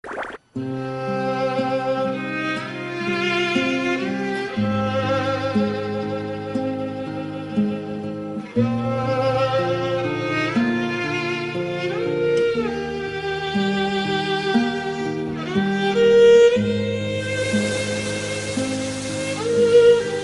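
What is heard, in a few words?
Sweet violin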